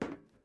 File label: metal step